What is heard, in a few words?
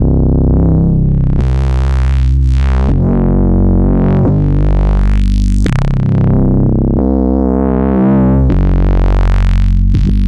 Crawl Bass, Drum n Bass; KJ Sawka; Drum n Bass & Beyond